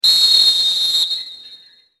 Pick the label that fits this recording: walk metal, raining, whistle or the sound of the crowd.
whistle